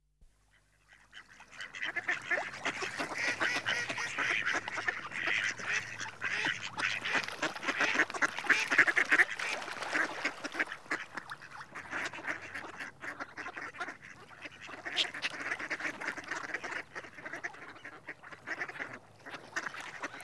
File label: Mallard (Anas Platyrhynchos) Male; Birds; BBC Sound Effects Library